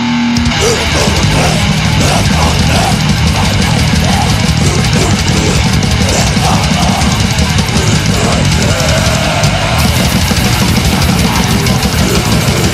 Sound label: black metal